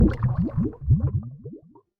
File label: stop; Default sound pack extended.